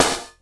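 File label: snare drum, Drum & Bass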